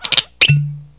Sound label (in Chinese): 开瓶; 日常生活; 特酷声音素材库